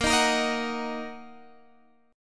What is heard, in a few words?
Fsharp 6th, guitar chord